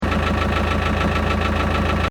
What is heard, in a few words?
Engine: Tick Over Revs Loop, car; Diesel; Cars; Vehicles; Stuart Duffield